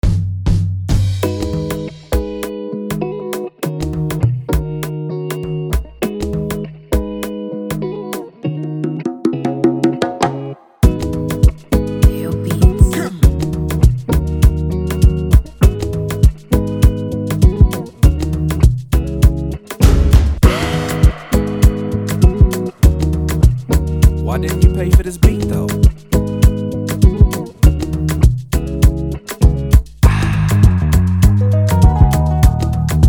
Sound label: Type Beat; Prod By Deobeats Music